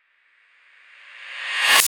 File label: reverse sound